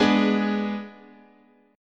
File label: G sharp Diminished, chord, guitar